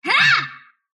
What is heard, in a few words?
sylph attack short; dota; dark willow